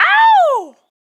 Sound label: short scream, dry, vocal; Sonic Foundry (Sony Creative Software) - Pocket Diva World Class Vocal Samples; Words